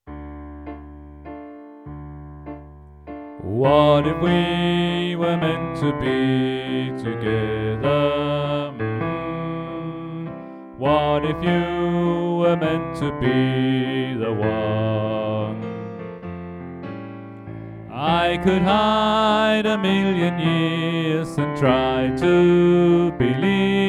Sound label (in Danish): Bas; oevefiler